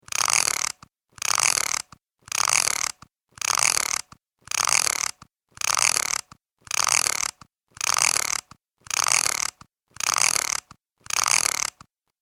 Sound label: Droid Clockwork Technology, robot sound effects